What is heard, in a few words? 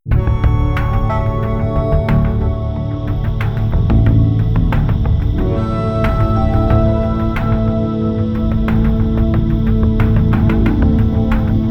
Jump; Laterous